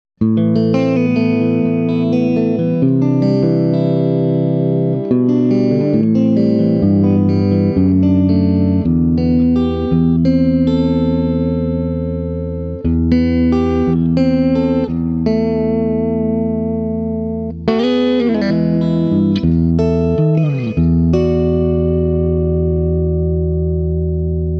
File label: Swart Amp